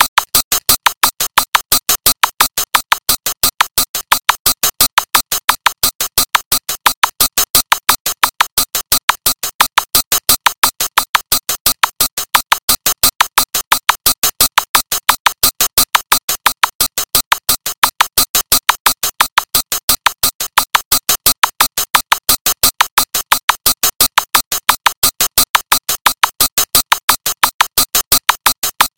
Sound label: Ticking Clock